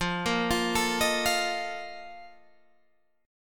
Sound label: Bb Major 7th Suspended 4th, F, chord